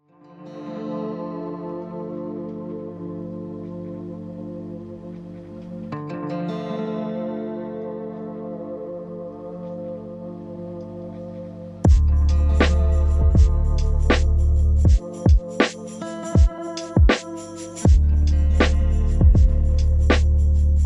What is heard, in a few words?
Beat